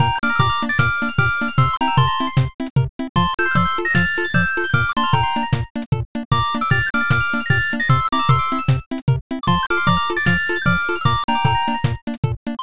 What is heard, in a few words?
bgm bright